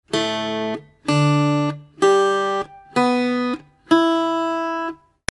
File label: CUATRO TUNING; CUATRO PT